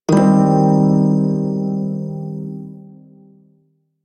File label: Shawzin Pent Min Chord; Warframe; Prime Shawzin